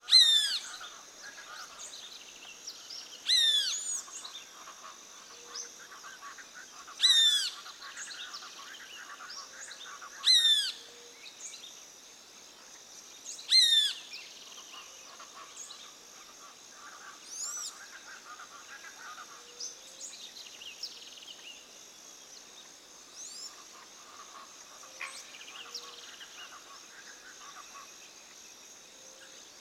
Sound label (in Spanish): SELVA DÍA MONTE TARAPOTO AVES E INSECTOS